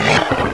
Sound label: gonome pain